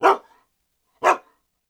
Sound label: bark, Convolution; convolution is a type of cross-synthesis; Like FM synthesis, convolution is a type of cross-synthesis